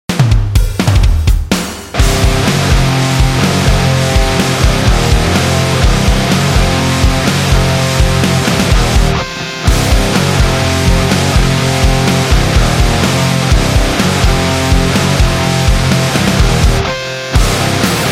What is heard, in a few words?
Hard Rock Music